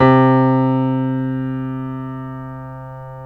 PNO Grand Pno; Club-50 - Foundations Roland

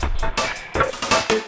LOOP, 162 BPM, Drum & Bass; Ueberschall; Drum & Bass Resonance

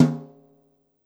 C3 Brush Tom 5, Yamaha PSR-E323 Brush Kit

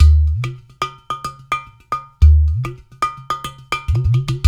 UDU, Udu Bata; Spectrasonics; Supreme Beats

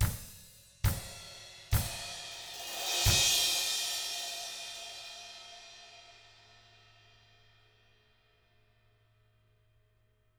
Double Platinum Drums, COUNTY; ILIO; Double Platinum Drums 1

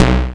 Filtered B, Basses; Zero-G; Total Drum Bass

